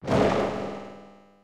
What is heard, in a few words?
Whoosher; Super Smash Bros